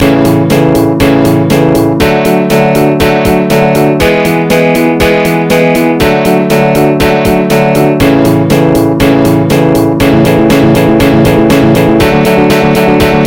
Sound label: MIDI Test